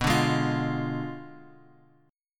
B Diminished 7th B down Bass Standard

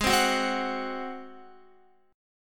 Ab 7th Ab Guitar 4F,4A,5C,5G,5C,6E; x,11,12,11,12,14; strummed

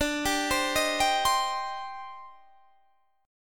C Minor D; Cm/D arpeggiated; JGuitar

